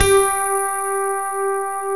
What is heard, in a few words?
GRAND PF G 3, AKAI S-Series; AKAI S-Series CD-ROM Sound Library VOL-7; D.GRAND PF